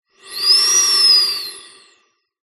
foley air blow inside small tube pipe whistle recorded at opening